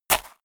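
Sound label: step walk dirt, footsteps; schritte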